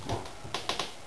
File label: Grate